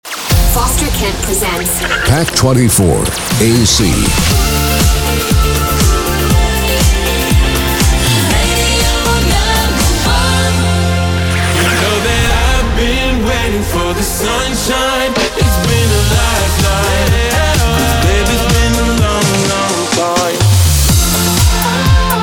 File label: AC; jinglepacks